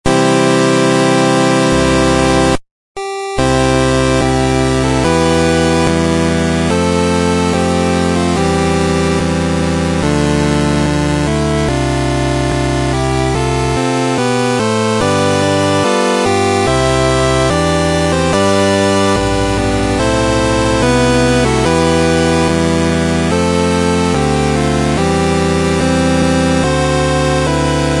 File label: Ringtone